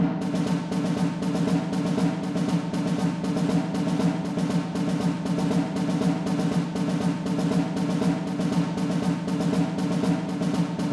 String; The Bureau XCOM Declassified

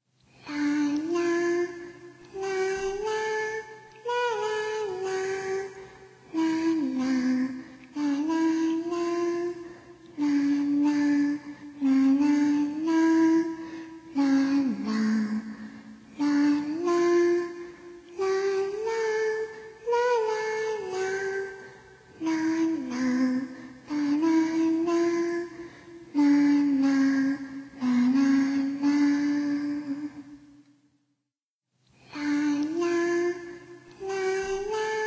Singing; mad father remake

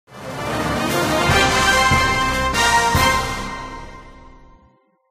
Victory; android

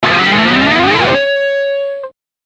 Pull Down

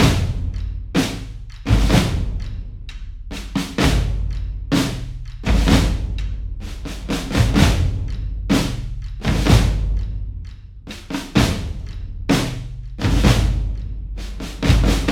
Drums; For Honor

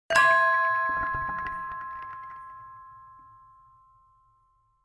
Bells

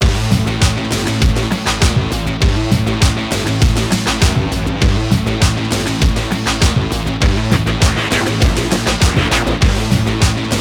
Menu; Monkey Fight Menu

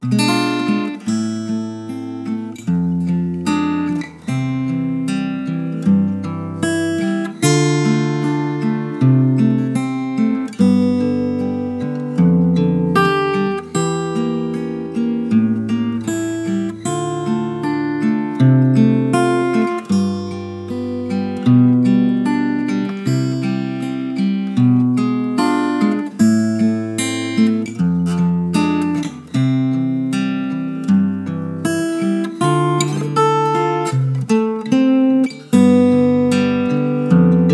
Last Light (Guitar Version); Metro Last Light soundtrack

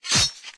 Daggerattack, dagger attack

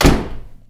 Door Close

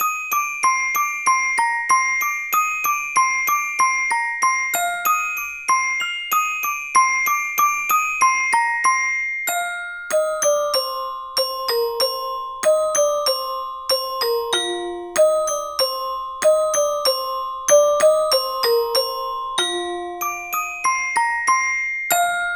Electrical Bells

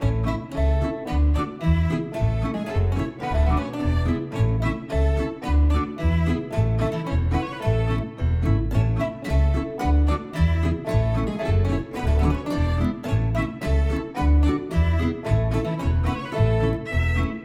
results screen music win; Talent Not Included; macOS Windows Xbox One

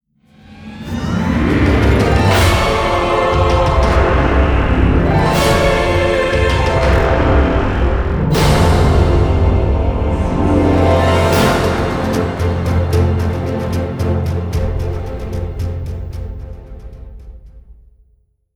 Stinger Win; Faeria; 2020 switch

